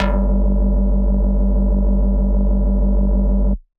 Dirty Dutch Lead G2

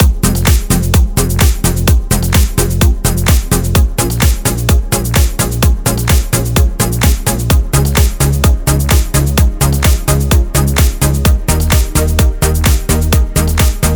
NuTrance Kit Mix; Mutekki Media; Vengeance Electroshock Vol.2

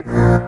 Bb STAB; funky; Loopmasters; Funky Underground Vol 2

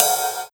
Ride, Cymbals

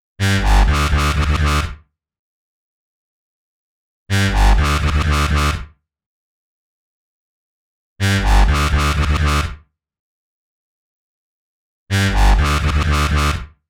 123 B Bass, Complex Electro Synths; Prime Loops; Impulse

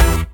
Synth Shot E

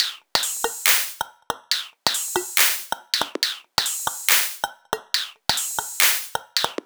Bpm140 Perc Loop, Dubstep; Molecular

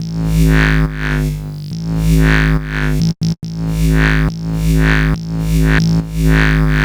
140 B BASSLINE, Dubstep; Loopmasters; The New Sound Of Dubstep; BASS LOOPS

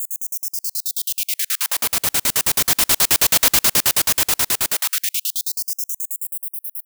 140 FX LOOPS; Dubstep; Loopmasters; The New Sound Of Dubstep

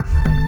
D STAB, Funky Underground

